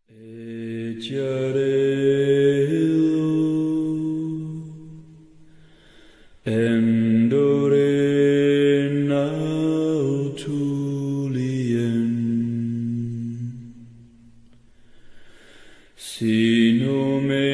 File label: vocals; lendil s oath; aragorn s song